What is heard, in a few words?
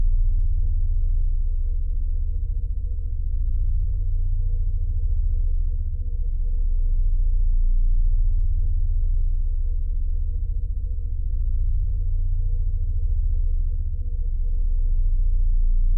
Wellhead Device